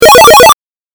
8bit 詠唱

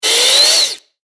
近未来動作音

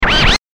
スクラッチ

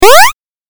8bit ジャンプ

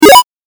8bit 選択

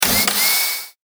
小型ロボットの駆動音

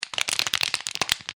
骨折

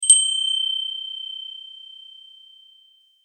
風鈴

